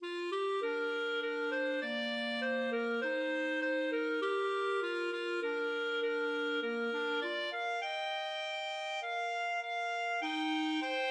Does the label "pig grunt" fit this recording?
No